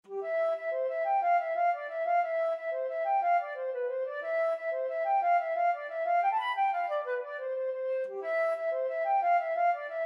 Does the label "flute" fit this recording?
Yes